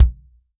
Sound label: FUNK KIT, REAL DRUMS; Best Service ProSamples vol.43 - Real Drum Kits